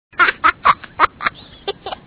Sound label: baby Sound